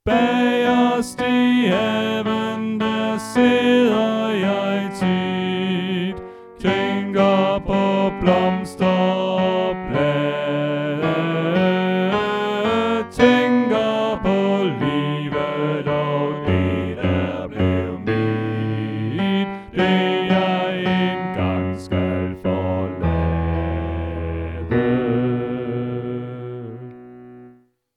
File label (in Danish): Bas; oevefiler